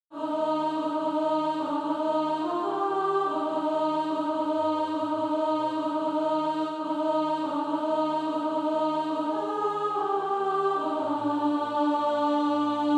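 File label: alto, SATB Practise Music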